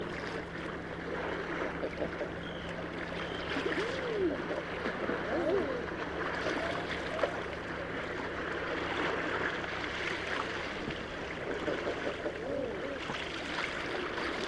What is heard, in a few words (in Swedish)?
Ejder